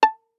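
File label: Violin pizzicato fortissimo on D string A4 stereo; MIS Pitches - 2014; Strings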